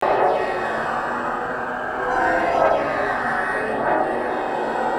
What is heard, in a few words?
HORROR; East West; Global Sounds